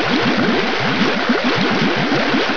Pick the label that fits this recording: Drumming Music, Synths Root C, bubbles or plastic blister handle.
bubbles